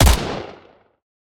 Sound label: p atk sndshotgun